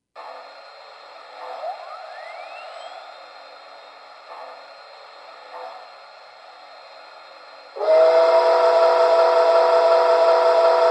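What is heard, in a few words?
Berkshire freight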